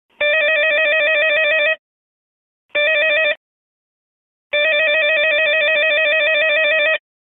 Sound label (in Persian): Zang Dar